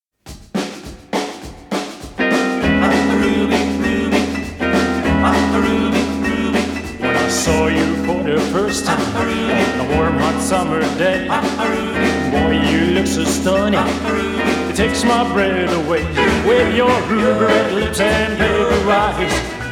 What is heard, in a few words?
rock jive